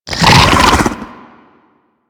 Infested Ancient Run Breathe, Enemies; Warframe

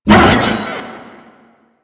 Ape Prelate Attack Short B; Enemies; Warframe; Proto Infested

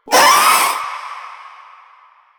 Proto Infested Runner Vocal Short Attack; Warframe

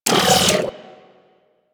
Servo Down; CTF Cephalon; Warframe; Ambience; PVP

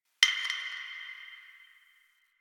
Interior Distant Marble Hit; Warframe; Ambience; Nineteen Ninety Nine; DE

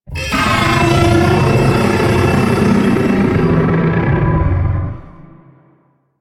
Arachnoid Camper Vocal Attack; Warframe; Corpus

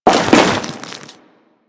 Crewman Land Ice, Footsteps; Warframe; Corpus

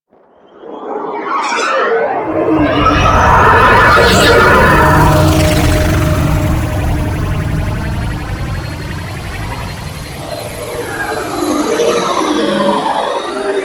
Insect Liset Relay Docking; Liset Flight

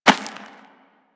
Rodent Hop Step Rear Dirt; Characters; Foley; Warframe

